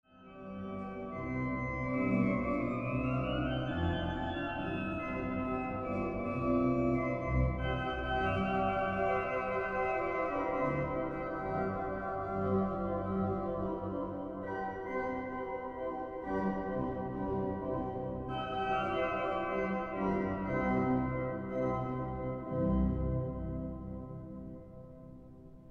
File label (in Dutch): Rondo In Mozart Stijl